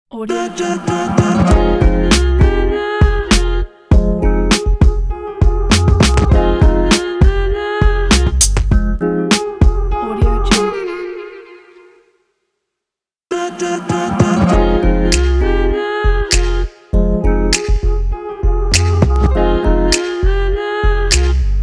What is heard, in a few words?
reality hip hop show ident